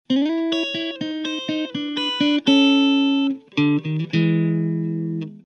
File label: Strat Clean